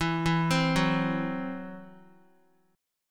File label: E Suspended 2nd Sharp 5th, Guitar, Esus2#5 chord; arpeggiated